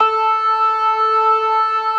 CLASSIC C3, Synth and Organ

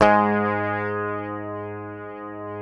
PICKED, Bass, G#2; Sampleheads; Will Lee Bass Library

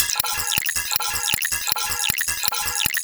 GROOVY; USB Soundscan vol.33; AKAI; Disjointed Textures; RYTHME

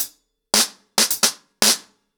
110BPM, ACOUSTIC DRUMS; The Roots Drumloops

